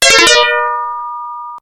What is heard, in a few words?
Coin; ZeroWing